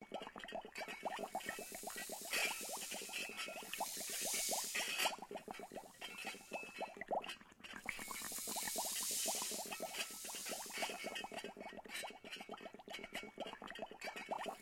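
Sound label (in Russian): крышка гремит на кастрюле вода кипит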